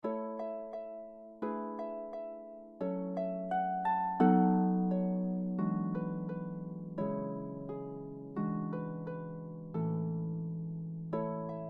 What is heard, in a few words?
Harp